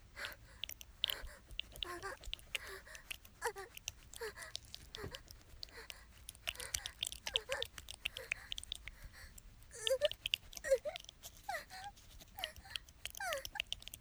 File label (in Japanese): 弱弱しく気持ちいい